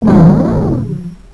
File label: pit drone pain; svencoop; sectore